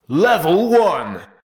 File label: Level One; Pitch Black Audio Pong